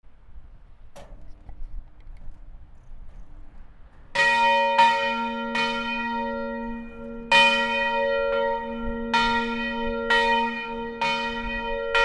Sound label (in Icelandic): kopavogskirkja messa 30min, kirkjuklukkur